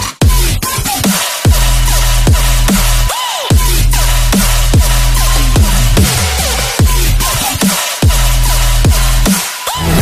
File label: hot electro ringtone